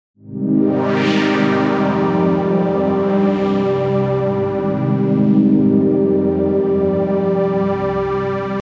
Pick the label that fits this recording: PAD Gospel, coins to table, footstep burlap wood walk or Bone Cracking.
PAD Gospel